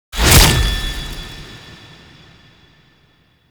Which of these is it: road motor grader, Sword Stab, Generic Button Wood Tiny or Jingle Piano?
Sword Stab